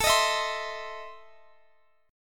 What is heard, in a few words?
E Major 7th Suspended 4th Sharp 5th, A